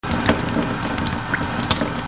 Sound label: Water Cooler